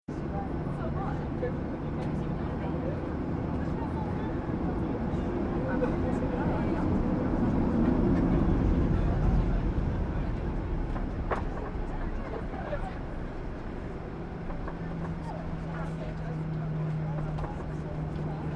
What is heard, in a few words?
Aircraft On Runway Internal Jet Engine Revs Manuvas Crowd Walla Rattling Creaking; Airplane Sound Effects